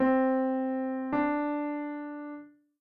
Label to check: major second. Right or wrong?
Right